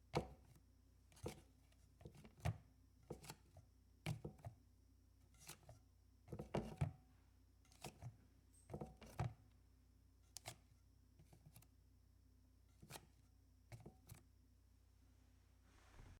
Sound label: baking, household